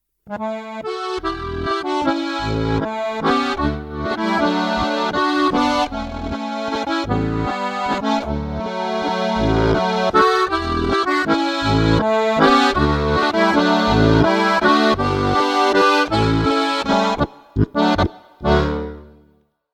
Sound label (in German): Harmonika TA3000X flach Walzer